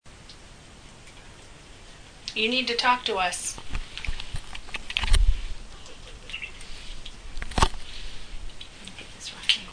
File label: giggle